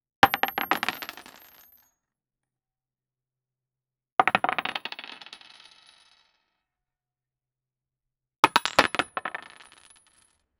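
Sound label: heavy solid objects falling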